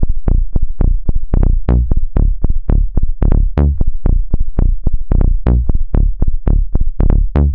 Moogy Techno Bass; bass loop

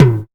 sharp tom drum a sharp key

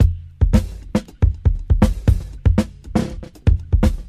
117 bpm breakbeat f key

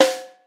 strong bottom end snare single hit c key

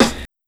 Old School Rich Lows Snare One Shot D Key

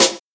natural steel snare drum sample e key

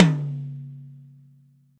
big room tom sample c sharp key

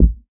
smooth kick drum a key; kick drum samples

